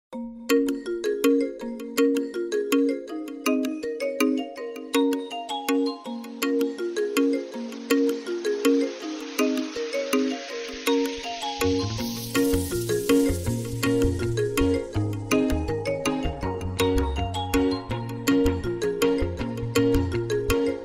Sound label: ringtone, samsung